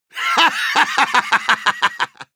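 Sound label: laugh happy, Russian; Soldier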